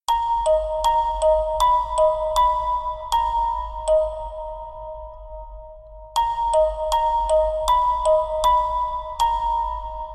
Winter Music Box

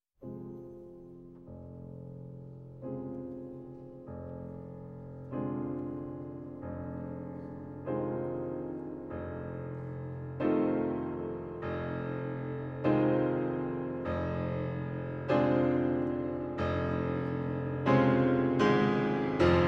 Piano Concerto in C Minor, Moderato (Live); Live in Australia, 2003

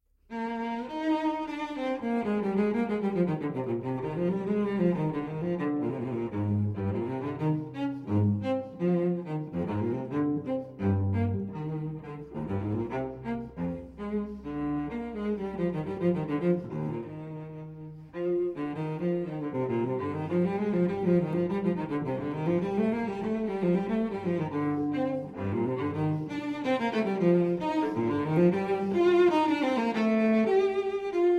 Cello Suite in E-Flat Major Allemande; Cello Suite No. 4 in E-Flat Major, BWV 1010; II. Allemande

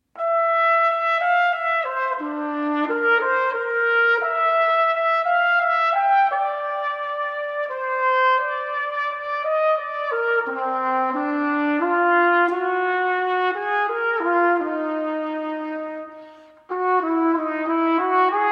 Unaccompanied Solo Trumpet, Waltz; Suite For Unaccompanied Solo Trumpet IV.

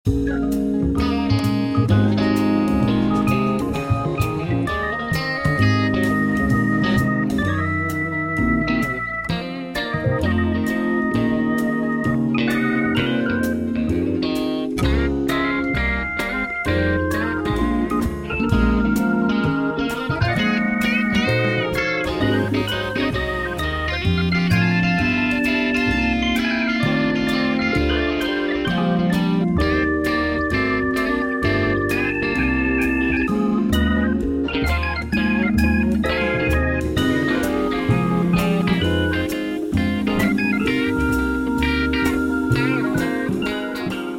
Blues Lounge Music

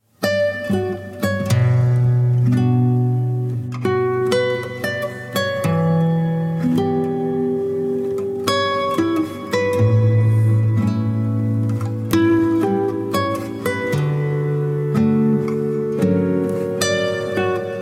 Instrumental

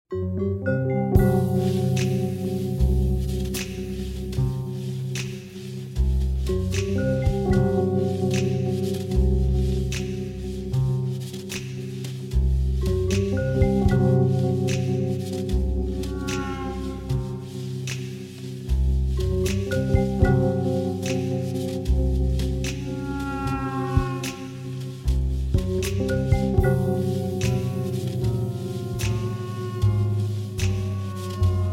Instrumental

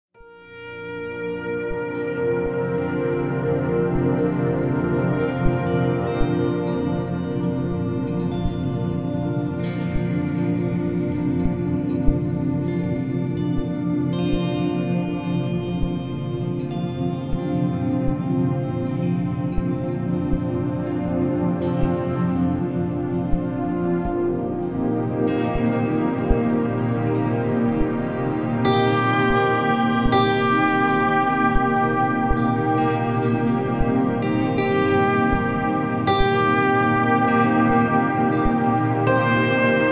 Acoustic

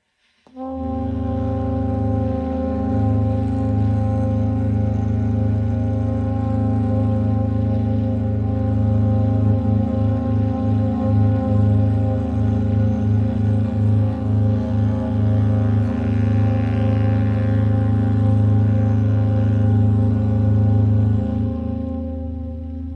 A Flat Major Prelude

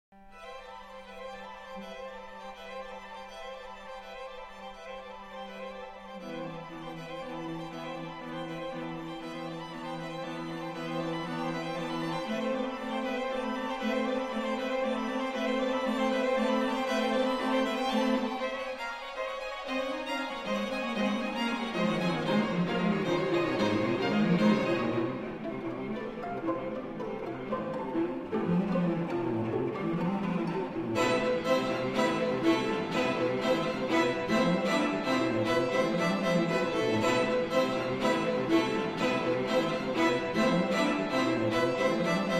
String Quartet

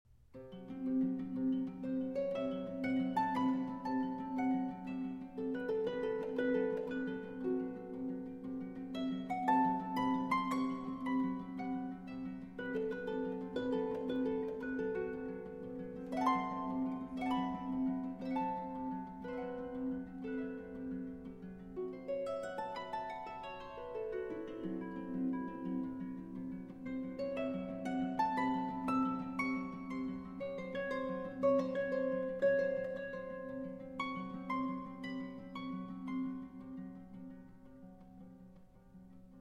Suite For Harp, Op. 270 VI. Presto Misterioso; Hovhaness

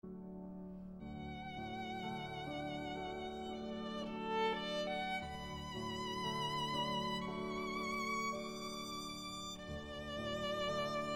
Meditation (Version For Violin and Piano)

